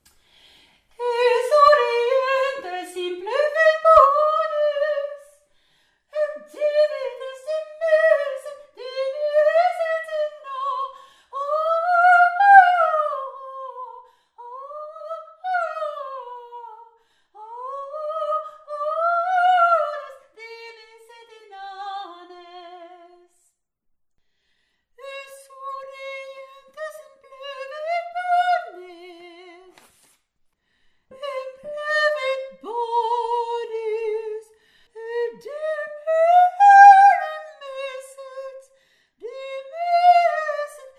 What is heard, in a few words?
esurientes voix